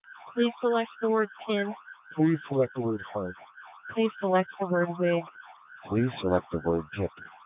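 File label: P25 half rate siren; Codec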